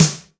F Stand Snare, Fusion Kit; Akai MPC500

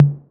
TOMS 808

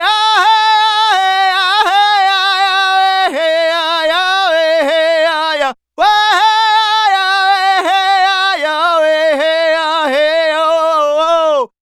Native Vocals, Native American

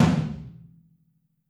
Drums Percussion Kit1 Room Mic

